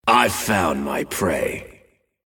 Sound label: regen; Vicious Hunter; Mob Voice